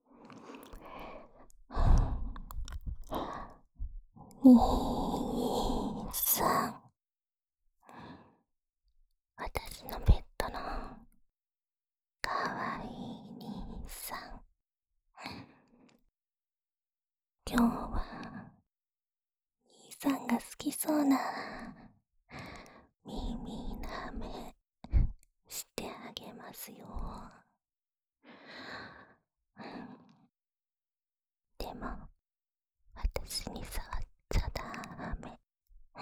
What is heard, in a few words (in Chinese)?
舔耳／右, ASMR; 被有如妹妹一样的青梅竹马宠爱调教的日子